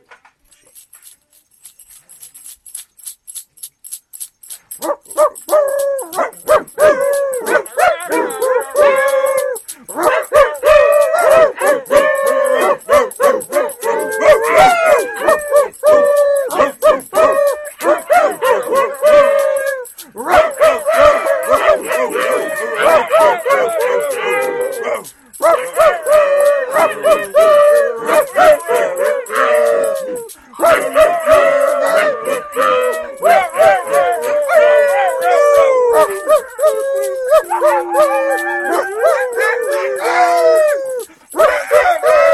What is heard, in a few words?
Barking Dog Jingle Bells; xmas; The Cartridge Family